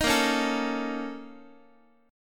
A sharp Minor Major 7th Double Flat 5th A sharp Guitar; A#mM7bb5 strummed; JGuitar